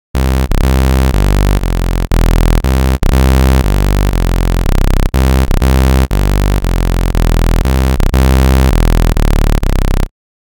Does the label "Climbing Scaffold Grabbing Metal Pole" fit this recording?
No